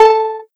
soft hit whistle; osu

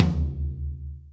Tom Hit

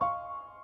piano high

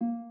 Harp

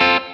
clean C#m7 Staccato

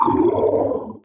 Growl

